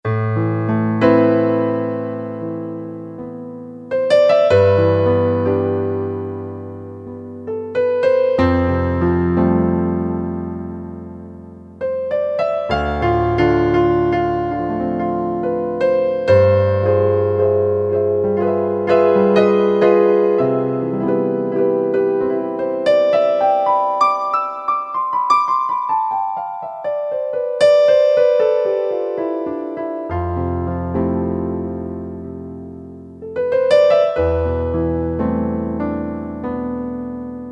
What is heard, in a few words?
Grand Pno, Yamaha YPT-400; Voices